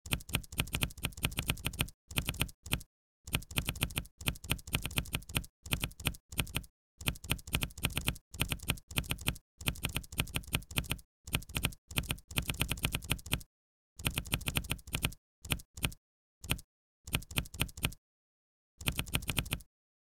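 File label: mechanical keyboard typing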